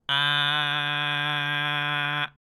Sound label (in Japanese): 小人の喉頭のGの声で「あ」